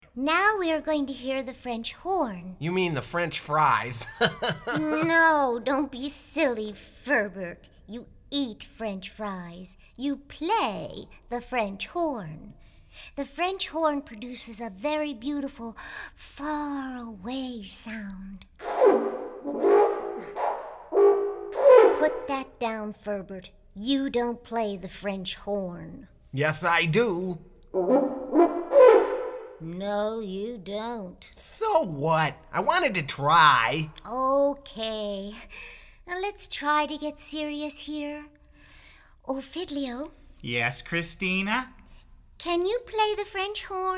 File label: French Horn; Classical